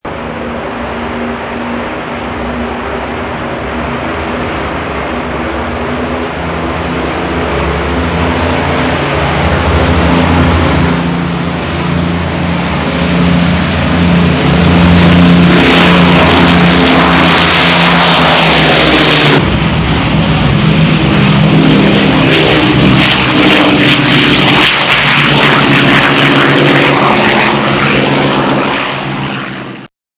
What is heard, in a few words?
lancaster bomber